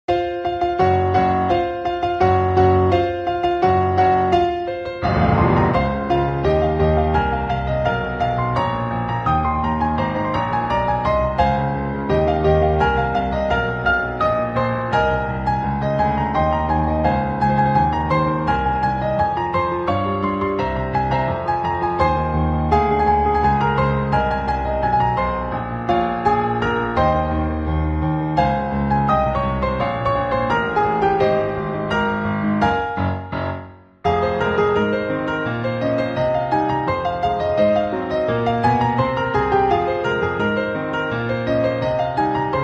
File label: piano